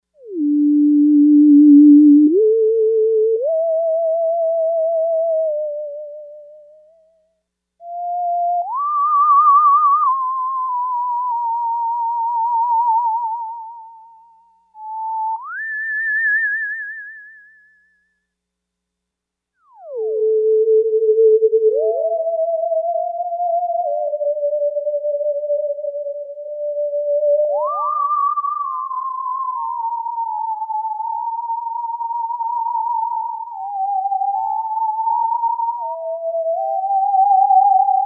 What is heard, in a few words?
Tape Echo, Lexicon MPX 1; Factory Programs